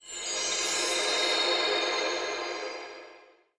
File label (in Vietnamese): Am thanh giai bua chu tam linh